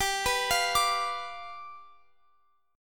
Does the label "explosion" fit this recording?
No